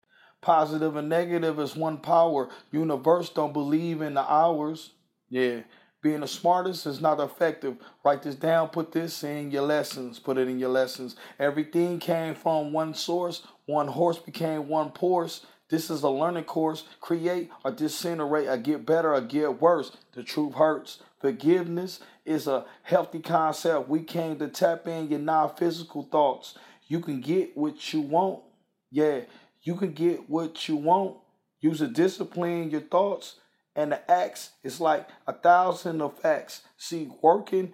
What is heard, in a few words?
hip hop, 111, Dm, acapellas